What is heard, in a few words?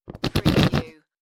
Whoopee Farting Blowing Raspberry Sound